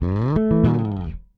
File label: Slide A, Bass; Bass X